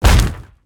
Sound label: tower fireball impact; npc; smite; phoenix